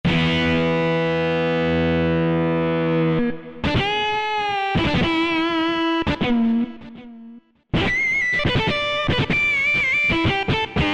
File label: Gt-Lead Switch, Yamaha EX5R; Factory presets